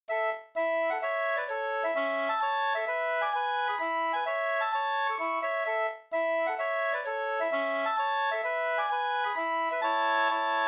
hambopolska, 2st

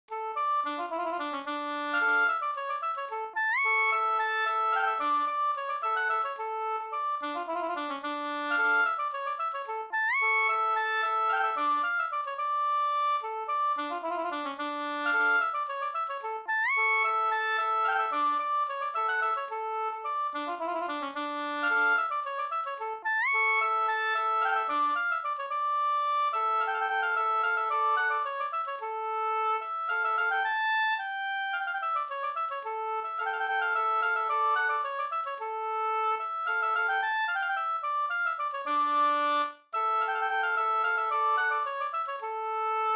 polska Bingsjopolska Skrikpolskan; e Pekkos Per

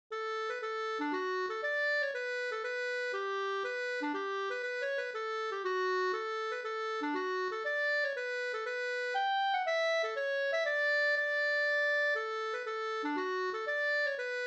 hamburska; e August Engstrom e Johan Albert Ahlgren; Ahlgren, Kobergsgården, Taxinge, Södermanlandd, Olof Anderssons uppteckningar 1936 i Södermanland, nr 320

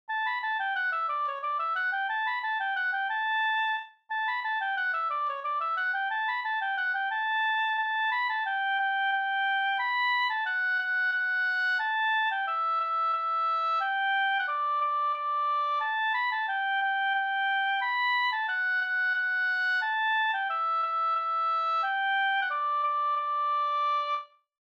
vals

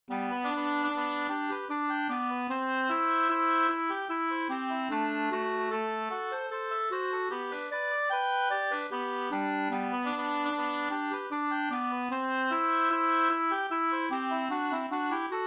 vals; På spelmannens bröllop, vals av August Strömberg, Jät, Småland; med andrastämma av Hj. Landberg

